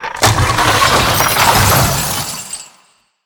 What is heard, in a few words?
Wishing Well Death